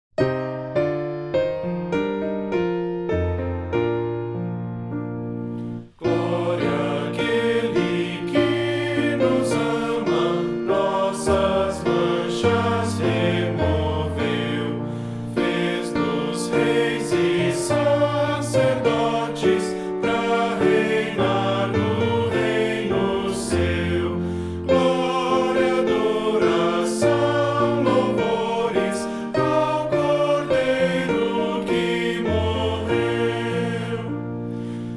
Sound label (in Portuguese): voz piano